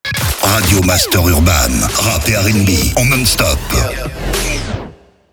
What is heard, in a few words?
JINGLE RADIO; RADIO MASTER URBAN; Habillage